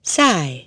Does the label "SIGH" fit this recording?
Yes